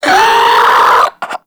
Infested Crawler Hear Sound; Warframe

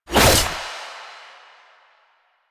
Naginata Whoosh; Warframe; Weapons; Melee; Koumei Naginata

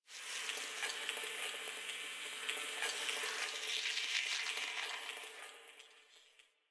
Data Texture; Warframe; Venus Open World; Venus Solaris United

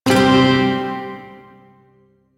Shawzin Chromatic Chord; Warframe; Duviri Shawzin

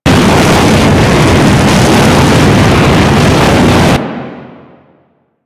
Tunnel Fire Loop; Ambience; Grineer Spy Vault; Warframe; Grineer Galleon

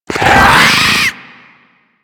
Infested Ancient Grapple Vocal; Warframe

